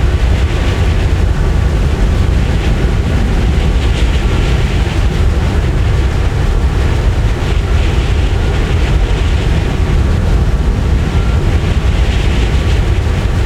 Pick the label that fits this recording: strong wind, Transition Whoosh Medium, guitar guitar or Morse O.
strong wind